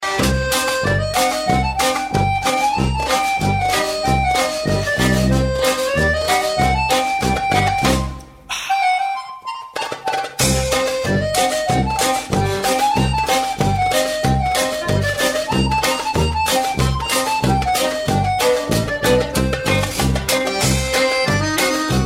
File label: Music Mix Balkan